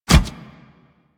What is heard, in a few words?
Step Metal, Footsteps; Warframe; Kubrow